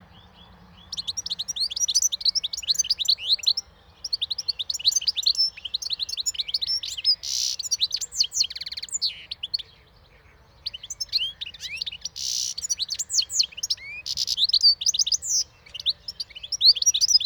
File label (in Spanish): Jilguero europeo Carduelis carduelis